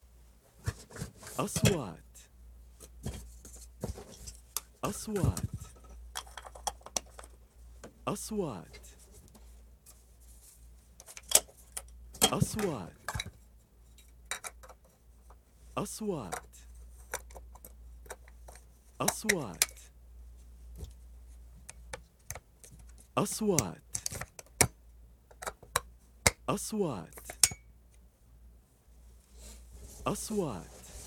bag equipments equipment bag camera shooting stuff